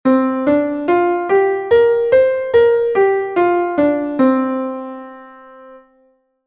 Mixolydian Pentatonic (19-EDO)

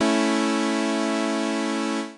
Major triad 12et saw

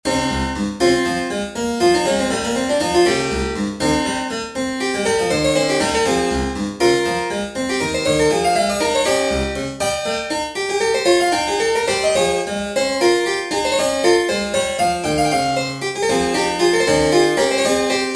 Diasem Lydian Example 19edo

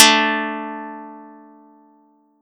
Audacity pluck

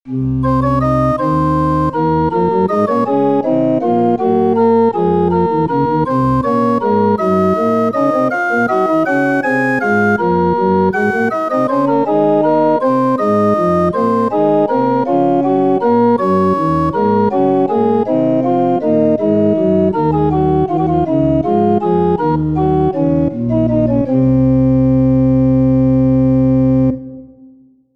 Diasem Locrian Example 21edo

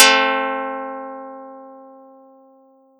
Audacity pluck

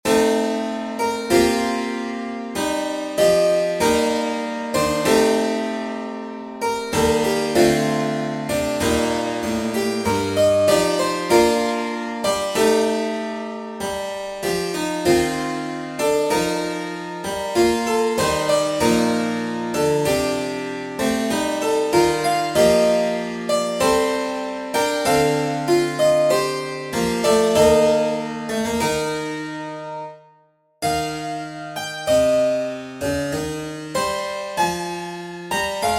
Locrian Suite Sarabande 17edo

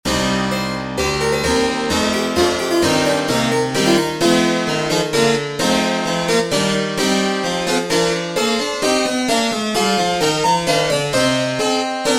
Diasem Dorian Example 21edo

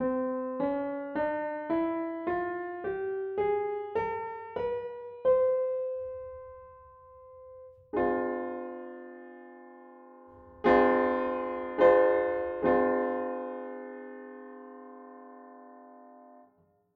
Orwell Nonatonic 53edo

